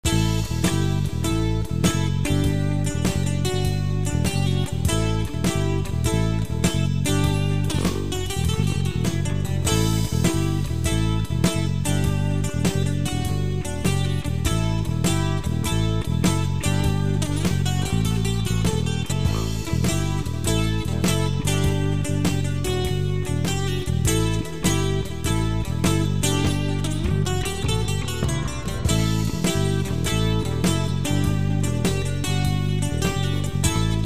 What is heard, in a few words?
Sunny day backing